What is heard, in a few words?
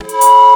train; Ragga & Dub Reggae; USB Soundscan vol.27; AKAI